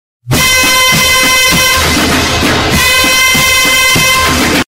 mehter

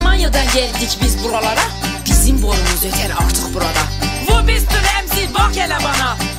film muzigi

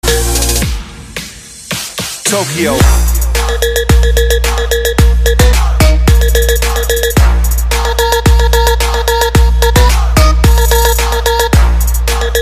zil sesi

